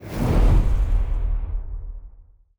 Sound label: feature Splash Change; netent